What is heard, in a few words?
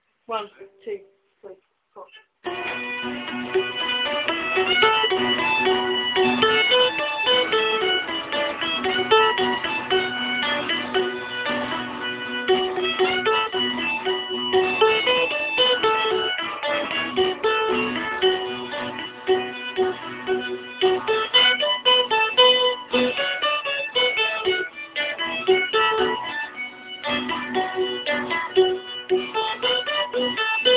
Fiddle Tune; Helen Gawain